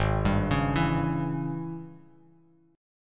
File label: G sharp Major 7th Suspended 4th Sharp 5th G sharp, down, Bass Standard; arpeggiated; chord